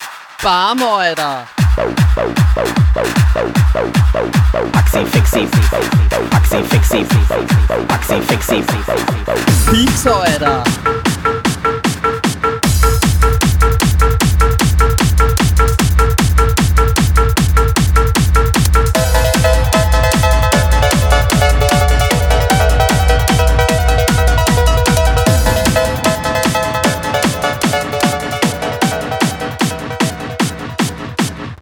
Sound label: hardstyle version, ringtone